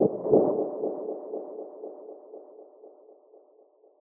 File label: Library Noise